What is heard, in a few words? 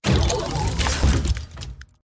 Activate